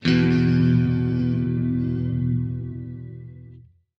Guit; GUITAR; 120 BPM

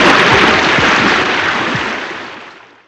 splash

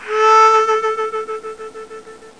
HARMONICA; Metro 4.5; MetroSounds

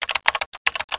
KEYBRD; Reflux 2: The Threshold

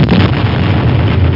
bombing; Epic Interactive Encyclopedia

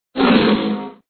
zoomby; screamin upstreamin

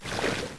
Blood Move; AmoebArena

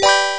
Chimechord

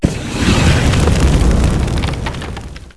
altar Burn Collapse; NETSTORM

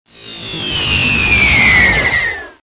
Hyper End

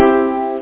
C MAJ Piano

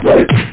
Big Swoosh Thud; Chaos

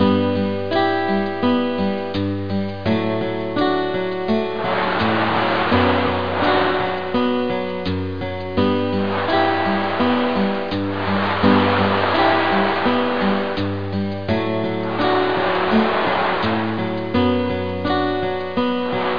beach; GORO

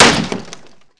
pipe hit wood; weapons; melee; kingpin

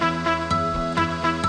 Exclamation

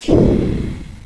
balloon Flame; NETSTORM

